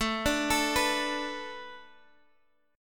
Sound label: A Suspended 4th Add 9th A